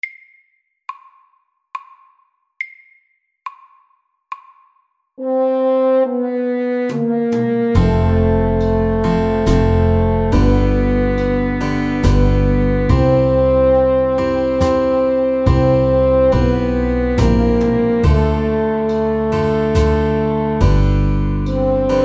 Ballade; SAKTE

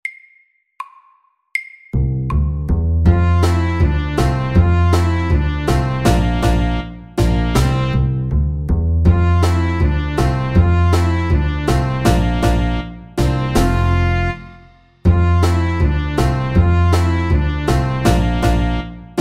Line dance SLOW 80

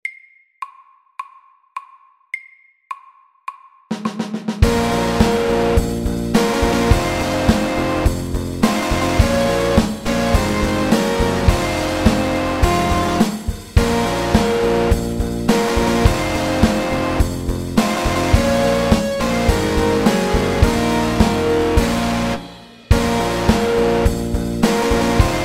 Triplet metal MIDDELS

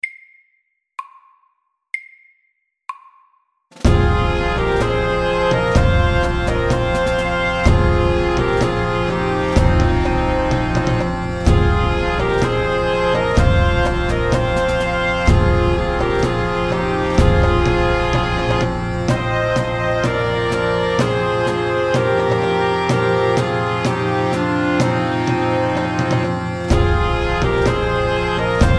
Pipes and drums FAST 126

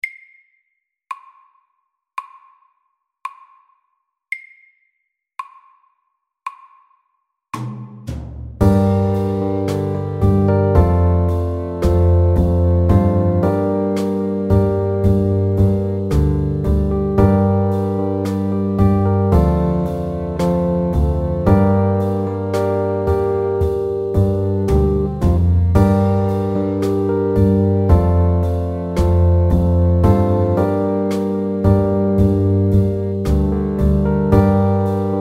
Pop ballad SLOW

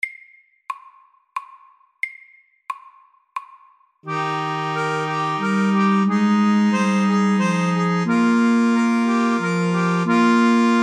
Musette MEDIUM

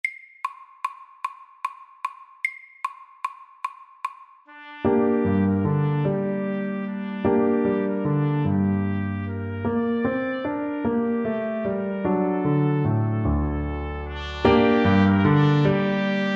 Bugle horn

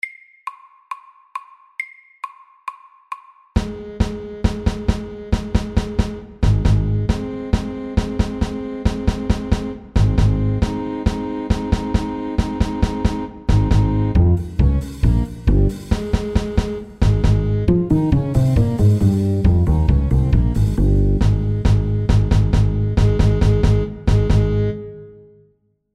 Football match FAST 136

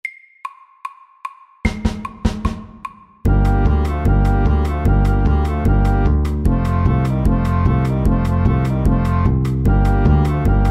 Balkan stomp FAST 150

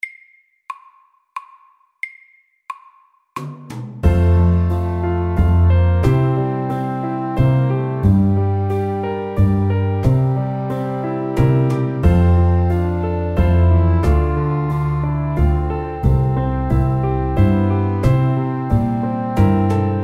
Soft rock FAST

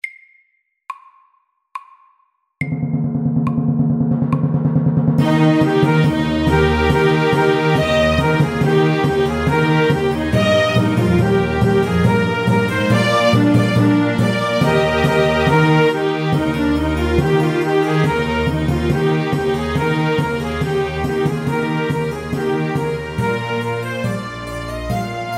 Air de trompette SAKTE

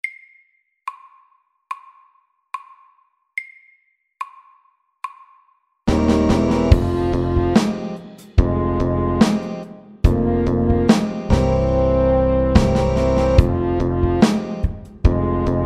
Funky; SAKTE

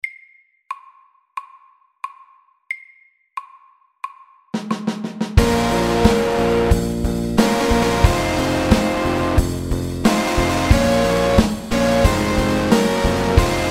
Triplet metal; SAKTE

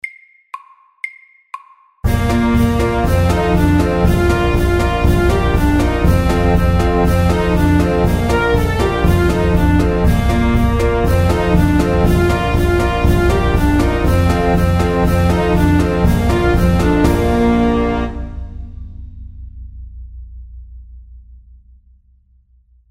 Cancan, 120

